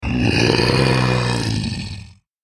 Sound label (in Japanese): クリーチャー ステレオ